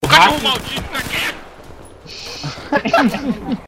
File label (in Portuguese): cachorro